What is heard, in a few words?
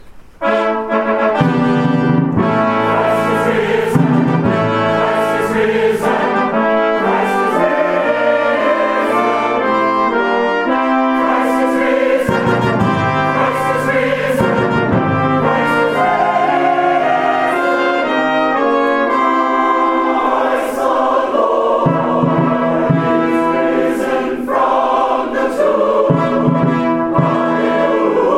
Easter Fanfare